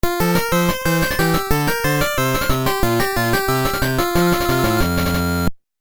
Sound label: Stage Clear; DKL